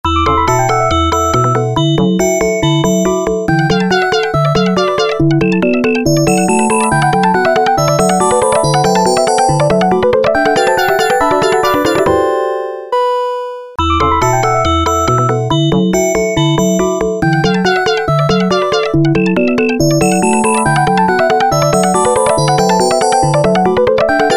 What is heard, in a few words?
New Hampshire Gamelan